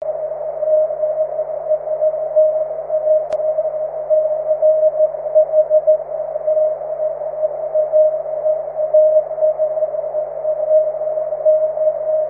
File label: cw; 23cm